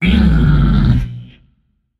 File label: tongue, voicepack; smite; jorm